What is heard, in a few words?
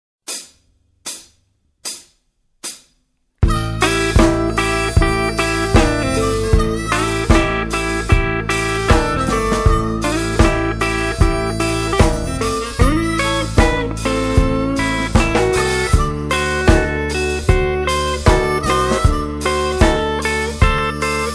blues jazz